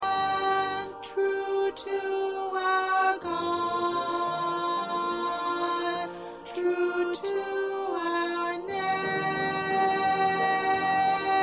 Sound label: 2nd Alto, choir